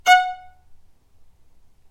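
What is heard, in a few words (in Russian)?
звук ноты фа диез вторая октава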